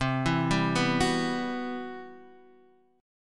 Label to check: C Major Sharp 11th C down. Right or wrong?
Right